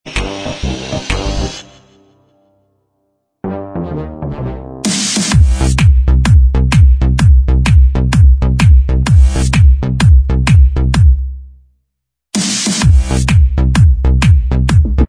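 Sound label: instrumental, track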